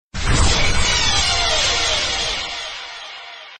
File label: Clash; Umineko